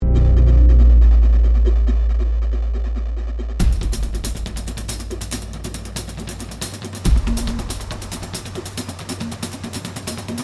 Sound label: Broken Glass